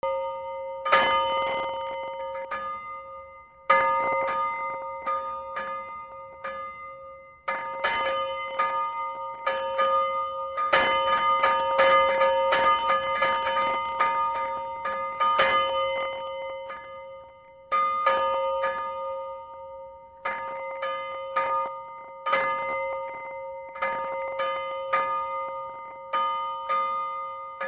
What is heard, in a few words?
harbor buoy bell